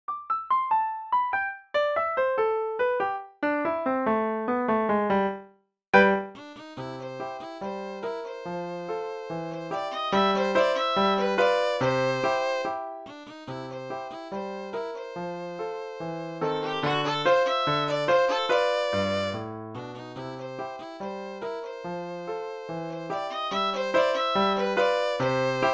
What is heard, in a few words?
the entertainer violin